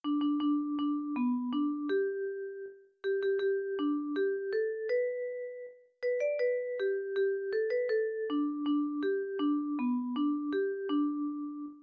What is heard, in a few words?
midi